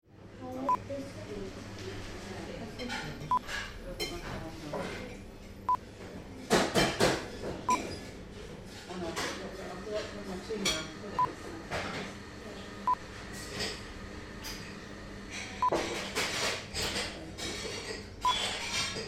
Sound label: Small Cafe Ambience